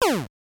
Punching Opponent; Mike Tyson's Punch-Out!!

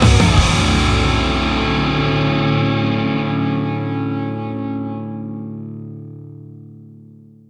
Mission Failed! Jingle; the simpsons hit run; ps2; Burning Rubber - Jingle 2

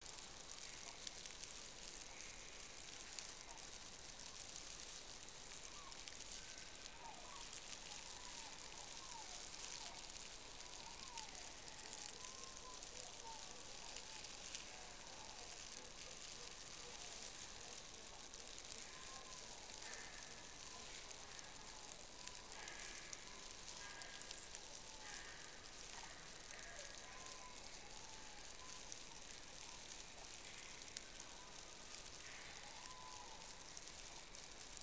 Jungle Fight amb

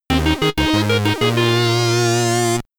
Get Ready! (SID Stereo); B-Bobs; commodore 64

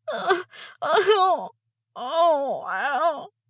moan

